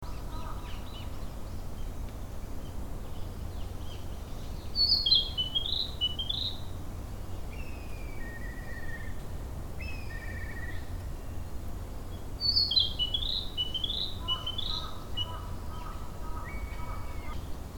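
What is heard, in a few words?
narcissus flycatcher; kibitaki; jyumokukouenn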